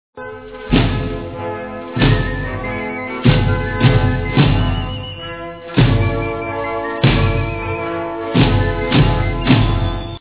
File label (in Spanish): marcha